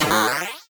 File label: jump; tempest 4000